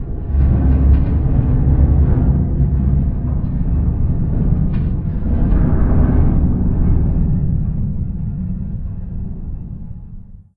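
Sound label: Metal Distant Groan Loop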